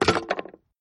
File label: skel die sound; Arc of Templar